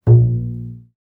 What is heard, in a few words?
pizz